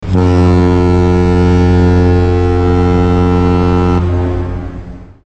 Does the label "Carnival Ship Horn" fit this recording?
Yes